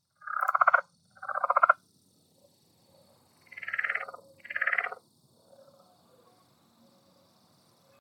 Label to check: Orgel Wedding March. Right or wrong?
Wrong